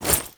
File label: Generic Foley; Warframe; Uriel